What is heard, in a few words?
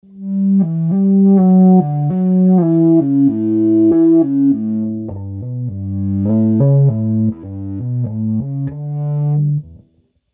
ebow Chello